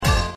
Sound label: two wild; Helloween; slottiger